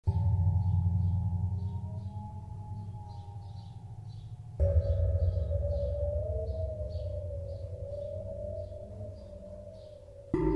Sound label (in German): waterphone schlegel